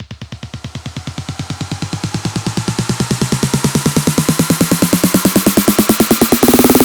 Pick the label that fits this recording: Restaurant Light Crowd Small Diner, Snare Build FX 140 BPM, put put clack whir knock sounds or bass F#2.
Snare Build FX 140 BPM